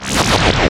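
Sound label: PER PROCU, Procussion, percussion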